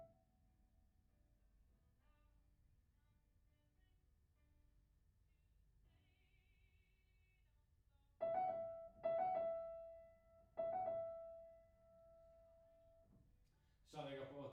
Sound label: Piano Room